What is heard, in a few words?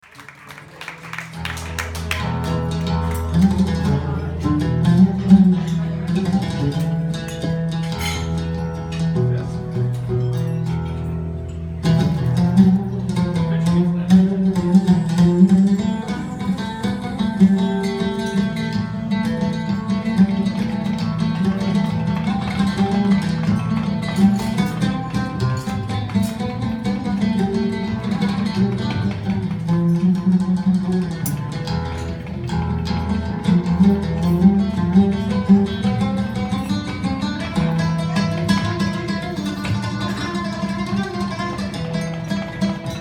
Oud Taksim Improvisation